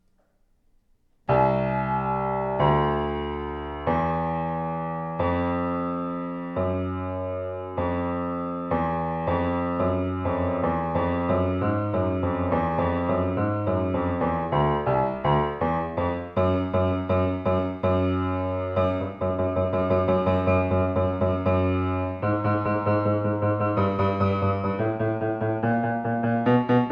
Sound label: bass